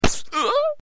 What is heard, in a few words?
blindness hit